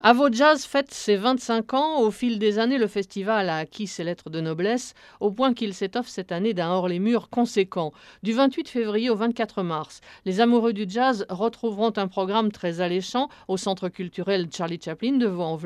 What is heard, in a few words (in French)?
jazz; Vaulx